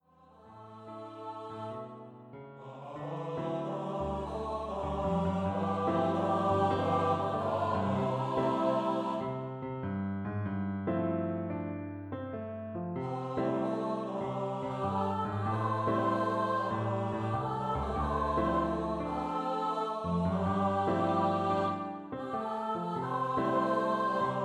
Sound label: vocal duet for Treble Bass or Baritone Voices